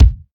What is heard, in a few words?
short kick drum sample c sharp key; kick drum samples